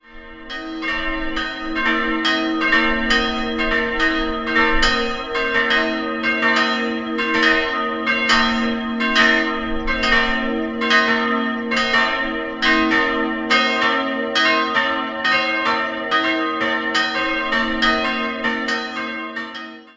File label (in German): Lambertsneukirchen, Pfarrkirche, Glocken; Gloria-Motiv b'-c''-es'', welches aber hörbar verfehlt wurde und eher einem verminderten Dreiklang nahekommt.